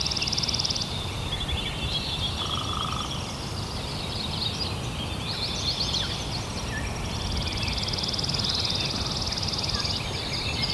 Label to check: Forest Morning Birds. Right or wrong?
Right